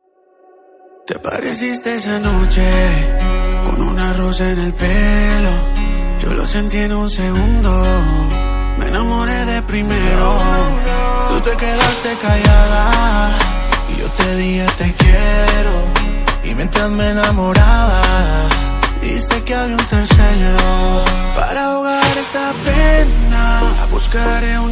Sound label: reggaeton